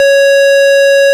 ORG VIP Cl 8'C#5, Farfisa Clar; Farfisa Clar DBar

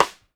CLICK, TAMA; Sampleheads; New York City Drumworks VOL-1; SP BLAK TAMA

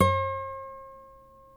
NYLON NO VIB D 5B, Guitars; Spectrasonics; Hans Zimmer Guitars VOL-1

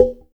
SLIT, ETHNIC DRUMS; Spectrasonics; Liquid Grooves 1 & 2

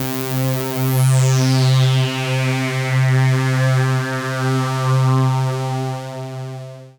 PAD, DIGI-PADS

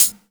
HIHAT HIP; 90 s Sample CDs; Best Service Dance Mega Drums

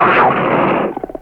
COUGAR SNARL; Greytsounds VOL-1

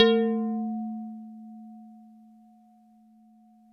HARP A2; Music Store; HARPOPHON

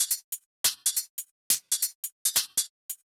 drum Loop 140BPM HH

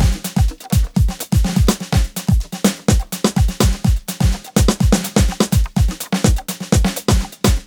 125 Nu Beat, Nu Jazz House; 125 Beats

